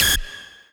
lead

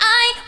vocal

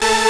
synth; osu

